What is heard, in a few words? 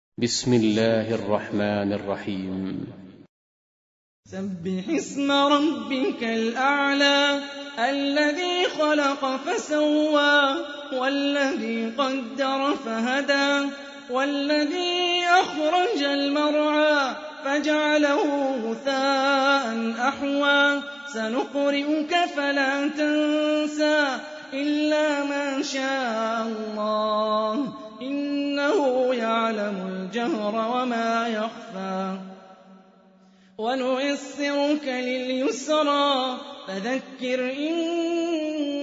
Quran; Hani Refaey